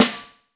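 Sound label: JAZZ snare; JAZZ KITS; Crypton; DrumBase5000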